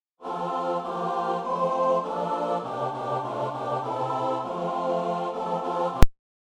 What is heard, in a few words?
midi; panie ufam milosierdziu twemu